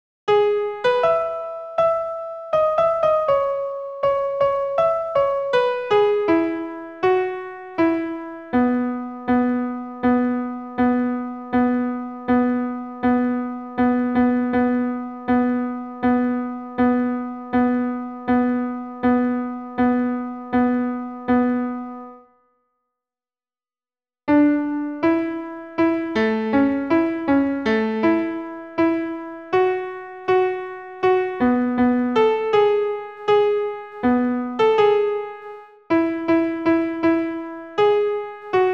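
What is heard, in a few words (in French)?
alto piano seul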